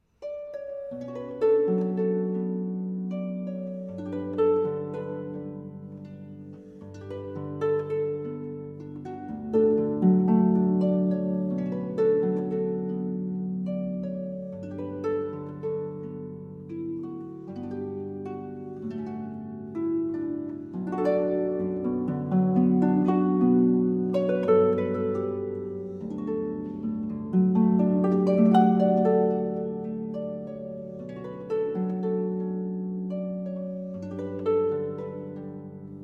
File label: harpist; Sting